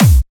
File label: Clubby Kicks, Bassdrums